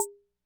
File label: Perc, classic machines; arranger